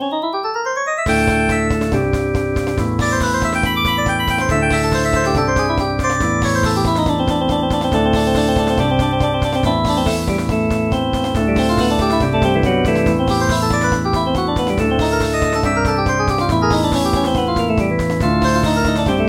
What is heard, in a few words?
Drawbar Organ; Harmodion; Syntheway